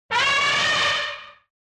fx elefante; mekanta

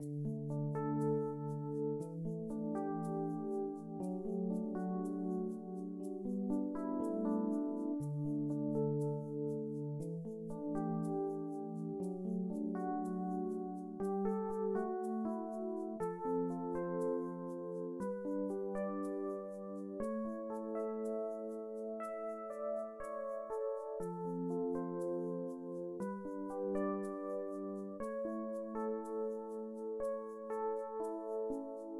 musica; Mekanta

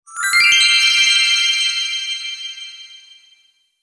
acierto; en pocas palabras; sonidos

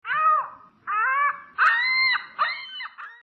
sonido nyandu; mekanta

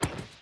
golpe volcan; mekanta; jungla

